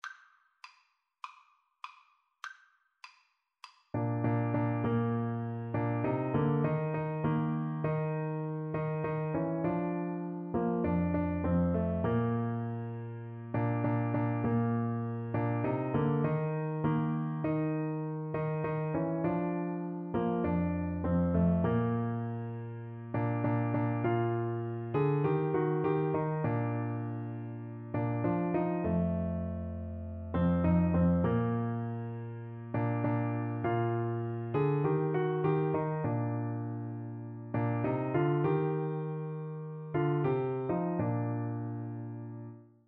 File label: piano duet; How Great Thou Art